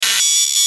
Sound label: Glitch Distortion Buzz; glitches; Blastwave